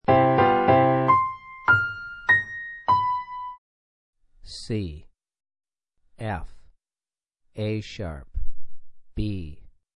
Piano Maj c5 f5 a#5 b4; ear training four note melodic

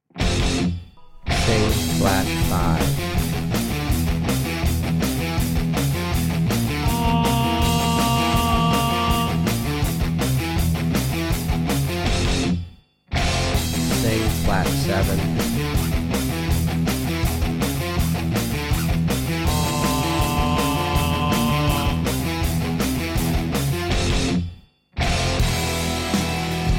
Tenor Key of E; real heavy metal ear training

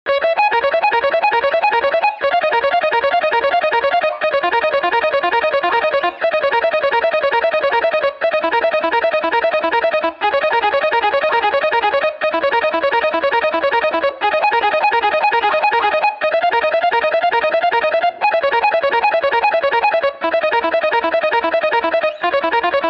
Key Bb Blues Mode1 Rock Guitar

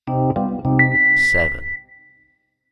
7th Rhoads Mark 1 Minor; ear training instrumental color

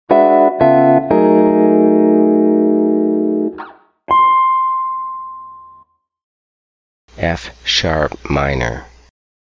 F Sharp m6911 Guitar; jazz guitar ear training; key note recognition

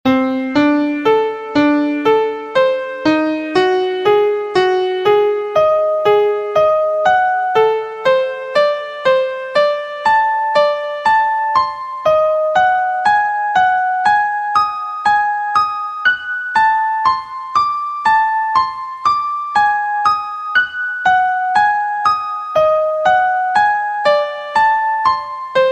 Degree 1 2 b3 b5 b6 6 Atomic Scale Key C; Harmonic and Melodic Equivalence; Two Triad Pair

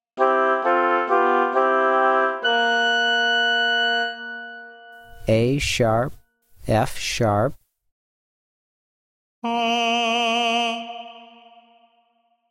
A#2 F#5 Oboe 2 Note Singing; Level Three “Singing” Example; ear training two note

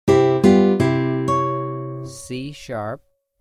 C#4 Acc Nylon Guitar Major; ear training instrumental color

